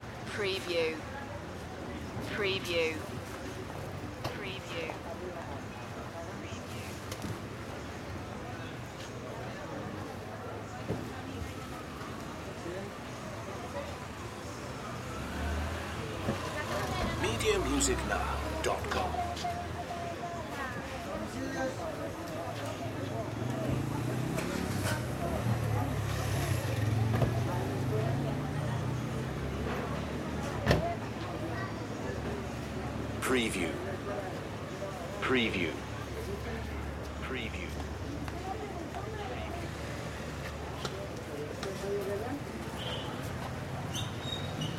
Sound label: city ambience colombia